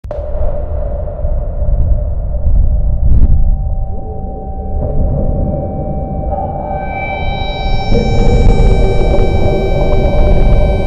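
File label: high five sounds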